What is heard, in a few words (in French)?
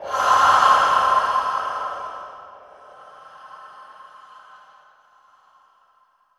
Respiration